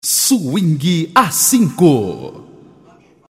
VINHETA

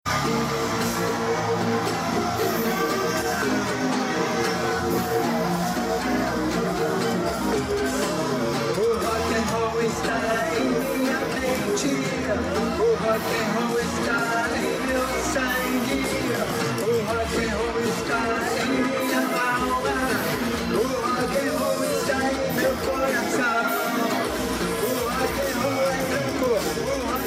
live acustico

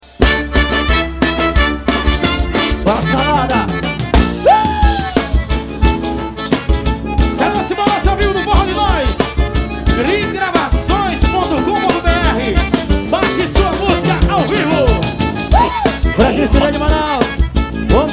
forro; Manaus

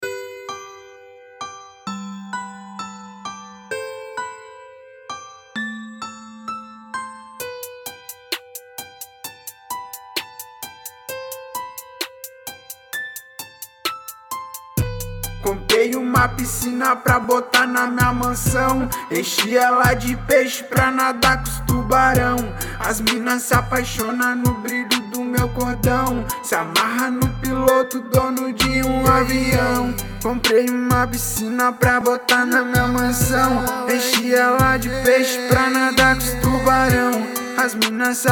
hip hop dono de aviao

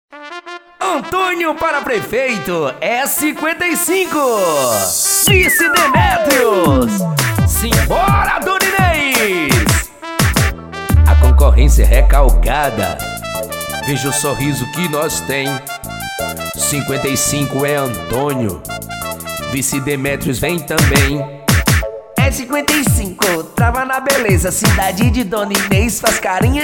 bregafunk

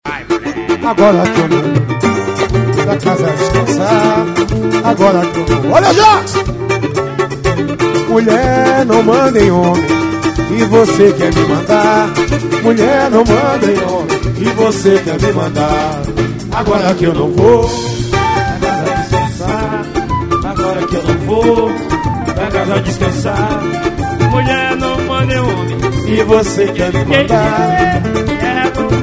samba

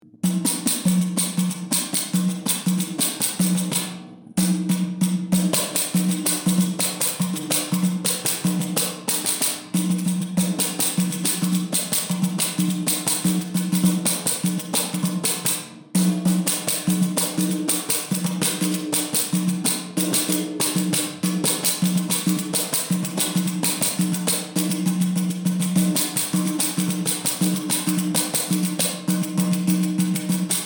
pot pourri instrumental speed 140x bpm 125

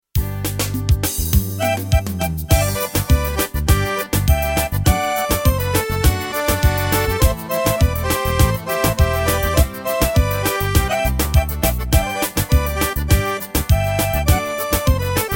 ritmos korg pa500 vaneira sertaneja

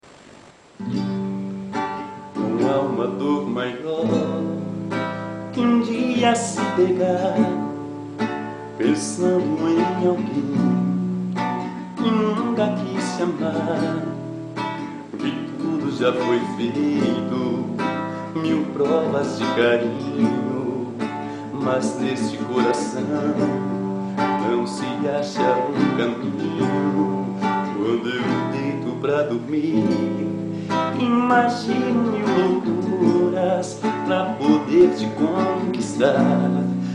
voz violao

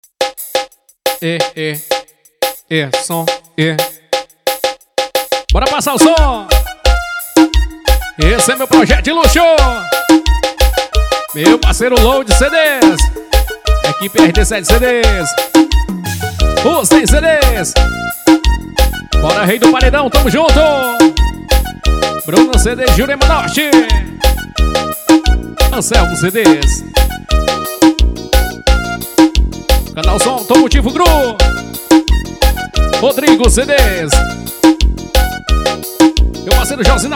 passagem de som

